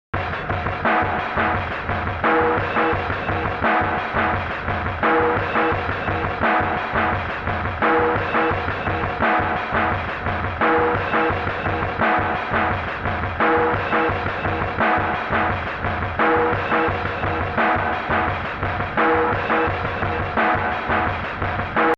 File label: groove